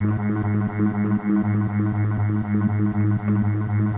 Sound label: Hum; ゆめ2っき